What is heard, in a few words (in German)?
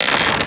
knarren